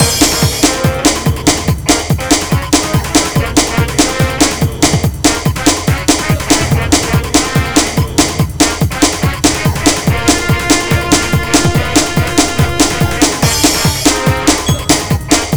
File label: Battle Loop; Asterix Obelix XXL 2